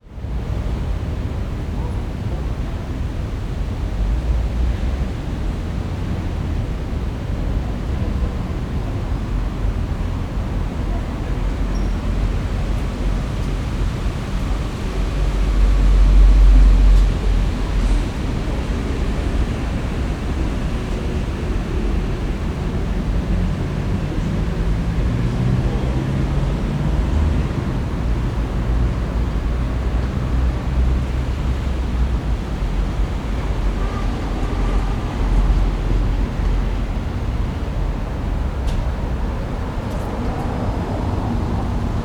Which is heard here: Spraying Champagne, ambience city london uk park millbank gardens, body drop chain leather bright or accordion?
ambience city london uk park millbank gardens